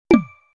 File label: button click